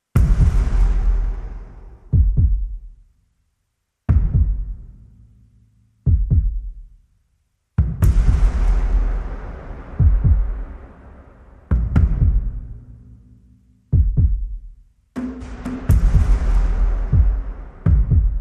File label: Percussion and SFX Only